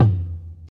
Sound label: nineties deep reggae tome drum sample f key, Royality free tom tuned to the F note